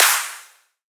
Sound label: Dubstep Clap; Ghosthack